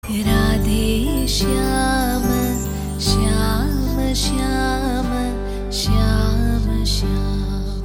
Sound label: Trailer Bgm; Radhe Shyam; Telugu